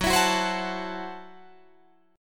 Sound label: Gb Minor 7th Flat 5th, Guitar Drop D